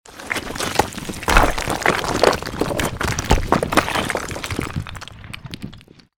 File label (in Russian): kamen skatyvaetsya po uklonu